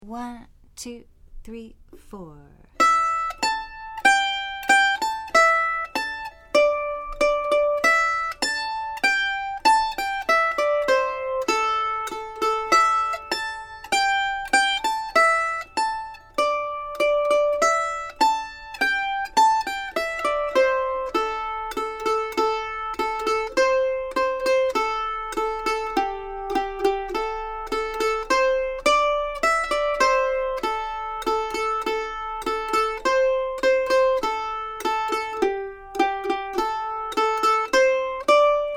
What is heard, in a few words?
Cluck Old Hen Melody